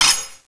sword clank with scrape

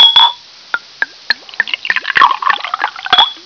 pour drink ice

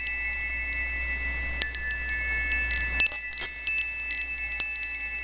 Minuet, windchimes

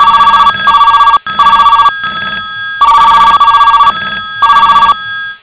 phones ring

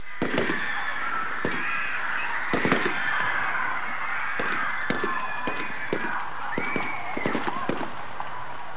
massacre sound, war sounds

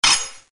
sword clank with scrape

